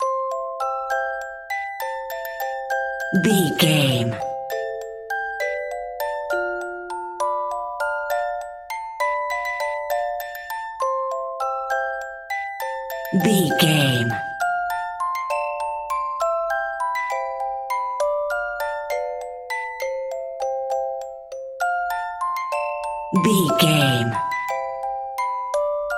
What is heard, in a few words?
music box